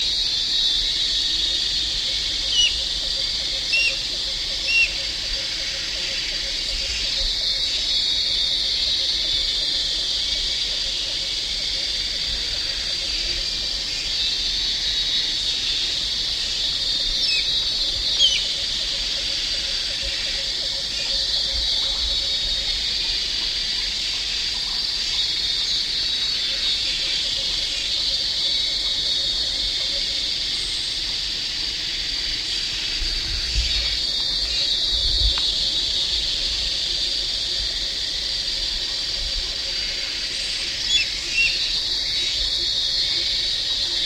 baza de jerdon, chant

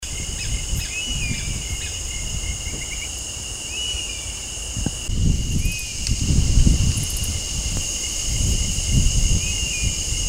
aigle de cassin

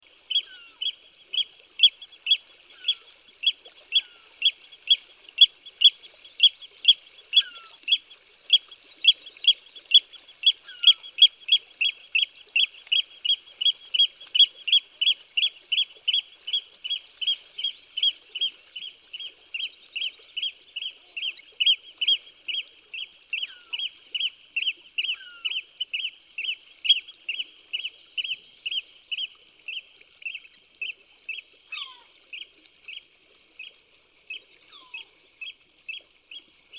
huitrier pie, chant

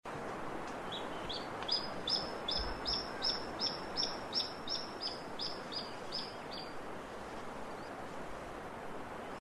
buse cul-blanc

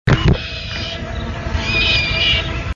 buse a dos gris

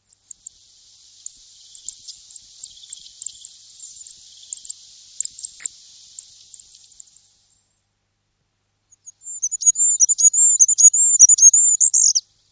roitelet huppe

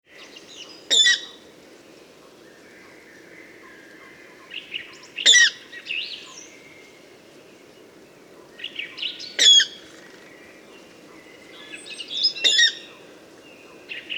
rale d eau, chant

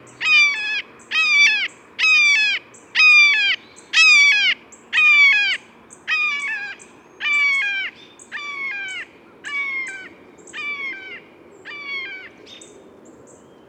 buse a epaulettes, chant

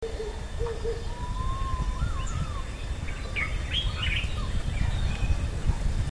colombar waalia, chant

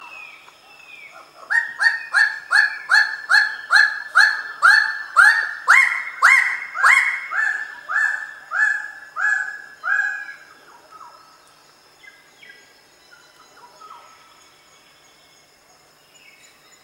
paradisier de raggi, chant

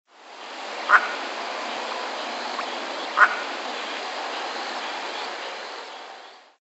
canard a collier noir

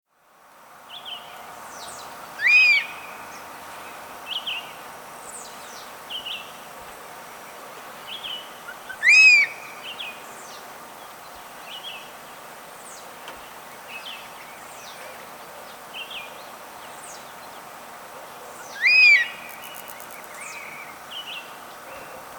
aigle tyran, chant

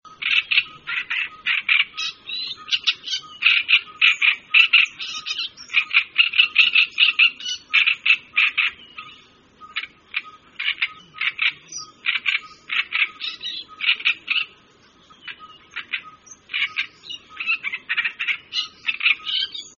rousserolle turdoide, chant

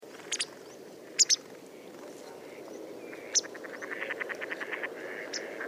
bergeronnette grise, chant

